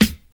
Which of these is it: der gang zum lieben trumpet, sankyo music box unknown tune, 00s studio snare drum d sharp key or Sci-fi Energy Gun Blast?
00s studio snare drum d sharp key